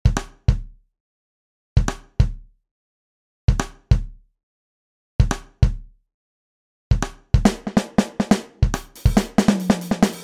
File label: perc; weeklybeats 2026